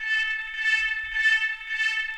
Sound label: ufo siren; Drum loops